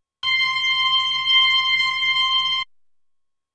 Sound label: B3 Organ; Masterbits CLIMAX 8